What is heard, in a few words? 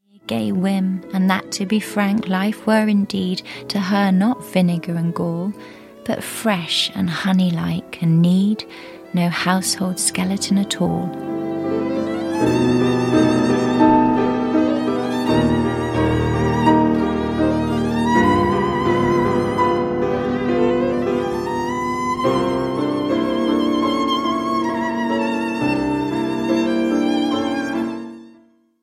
violin piano; lili boulanger; itamar golan; nocture for violin flute cello and piano